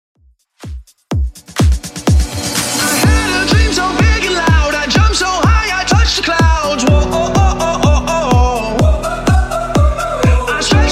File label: Bootleg House 125bpm; Gazzo